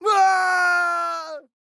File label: Fern Death